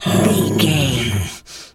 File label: bear roar soft